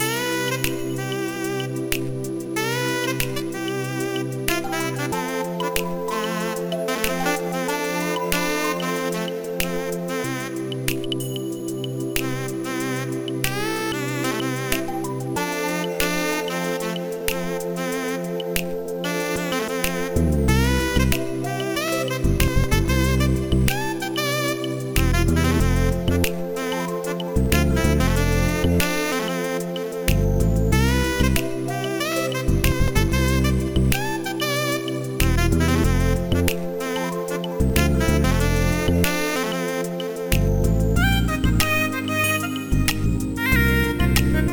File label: mod baritone; NUKE; baritone pacific